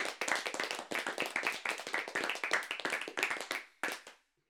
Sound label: Clapping, Eight Persons In Room